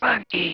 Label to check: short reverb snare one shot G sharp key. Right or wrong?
Wrong